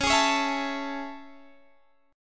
A Major 7th Db

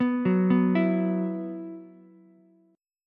B Suspended 4th, Ukulele; arpeggiated; JGuitar

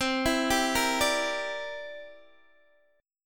C 9th C down Guitar DADGAD

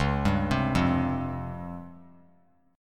C sharp Major 7th Flat 5th, C sharp, chord; arpeggiated; 13,14,15,15

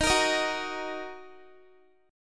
D sharp Minor, Guitar; D#m; strummed; x,18,20,20,18,x; 4E,4A,5D,5G,5C,6F; chord shape

